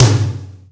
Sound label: ROOM TOM 2; Greytsounds; Scott Peers Sound Engineering